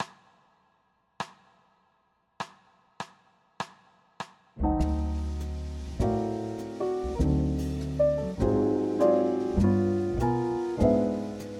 D major blues tenor; Applied Jazz Ear Training; Practice Perfect; 12 Bar Blues Ear Training in Real Time

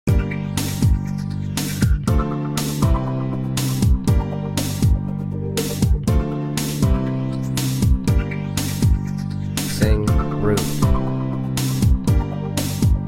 Soprano Key of G, dance and techno music; Dance and Techno Music Ear Training; Practice Perfect

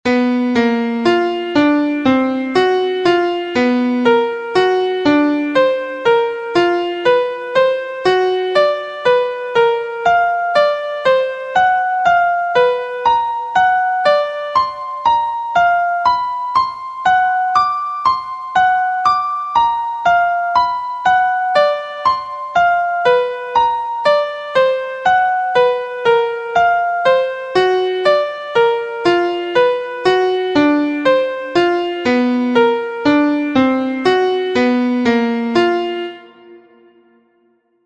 Degree 1 b2 2 3 5 b6 Atomic Scale Key Bb; harmonic and melodic equivalence; trichord pair

